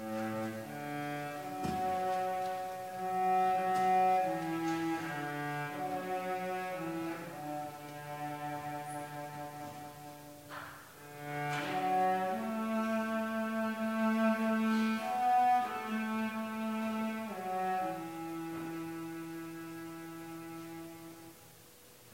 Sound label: Cello; Were You There